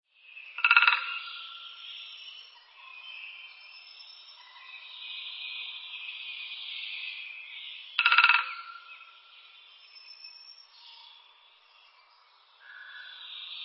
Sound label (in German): buntspecht, vogelstimmen